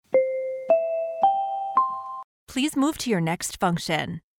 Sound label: four bright chimes please move to your next function